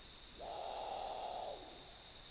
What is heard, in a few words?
Great Potoo